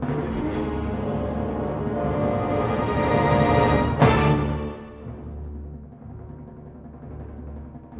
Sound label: Orchestra, Rock Pop; Sonic Foundry (Sony Creative Software); Orchestral Series VOL-4 - Rock and Pop